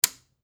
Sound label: large breaker panel toggle switch off